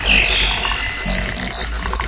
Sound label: synth; MELODIC SAMPLES; SYNTH AND ELECTRONIC